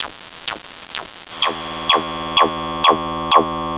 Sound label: 127 bpm broken oscillator, drum loop